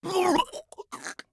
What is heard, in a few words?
scav death, voice; Escape from Tarkov; scav1